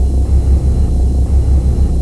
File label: machines; poseidon